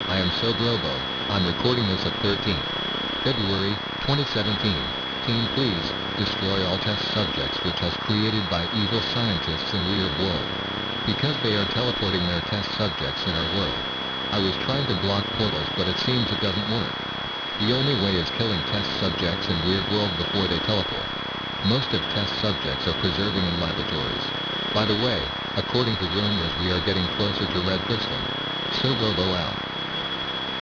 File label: holo; sven; sobrobo